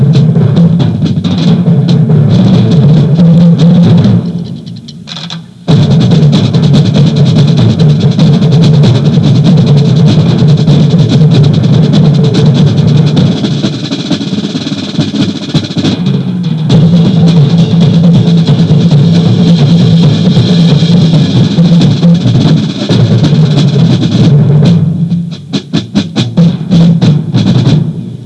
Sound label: drums